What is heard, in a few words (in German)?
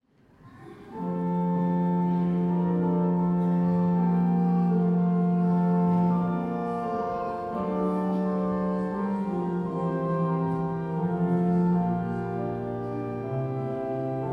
Benedictus, Chor; Herz Jesu 2024